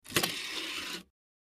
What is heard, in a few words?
Cd Rom Door Open Tray, computers